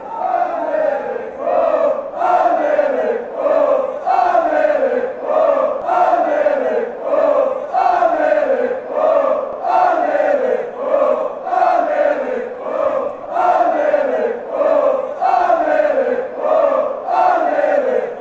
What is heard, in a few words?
fanchants